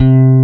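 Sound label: C2 Telecaster, guitar; AKAI S-Series CD-ROM Sound Library VOL-8; SET#1 GUITAR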